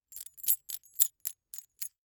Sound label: Coins Multiple Coins Jingle In Hand; Foley